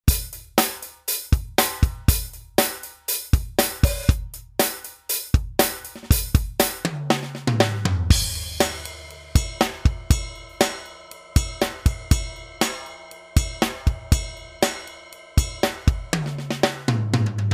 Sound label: td 20s pop